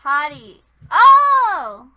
はり、Ohhh!

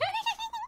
笑い

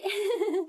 笑い

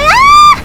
悲鳴

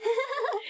笑

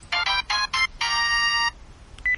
携帯音; ドナルド

